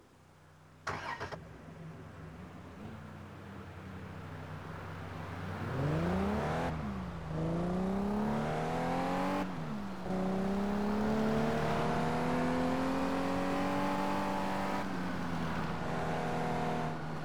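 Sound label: mercedes-benz cls 55 amg, car; TDU-1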